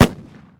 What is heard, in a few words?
pop; World Of Goo; Fuse